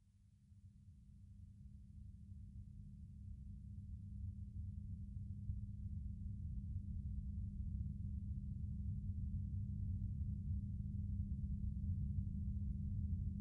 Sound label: Sound Of Train; Trek